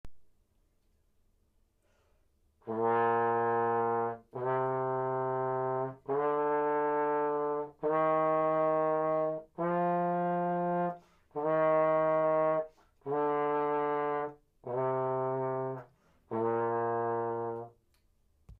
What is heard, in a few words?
trombone